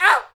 PRC PP, Ping Pong; Rhythm Section; FX Perc

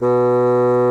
BASSOON B2, REEDS; Greytsounds VOL-1

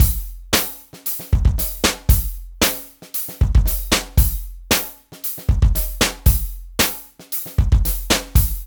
DRUMS, Loops; East West

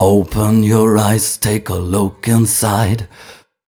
m 128 mel b-flat, Vocals

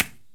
INDIAN PERC

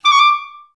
D5, Advanced Orchestra; Best Service

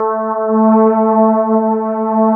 electric pianos; Wizoo Electric Pianos

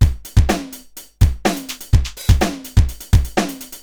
Akai XE8 (125BPM), beats

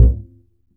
E STRING UPRIGHT BASS; Larry Seyer

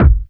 BD, 145 BPM; Ueberschall; Drum & Bass Resonance

